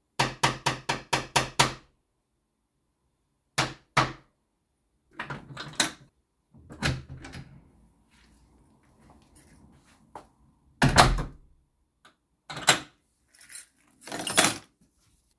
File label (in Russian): stuk kljuchom zashhelka otk zakr kladut kljuchi